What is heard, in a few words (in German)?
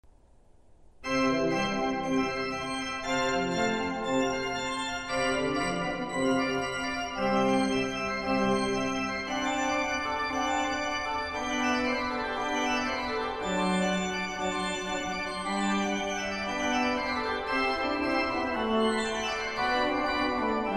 stucke dur eine dreh orgel